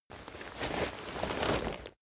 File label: leather metal handling short